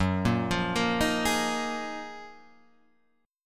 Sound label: F sharp Major 13th Guitar Half Step Down